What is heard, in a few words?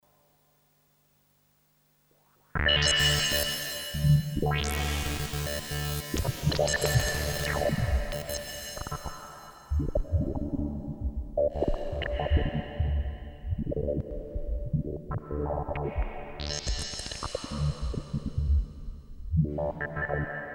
midi control test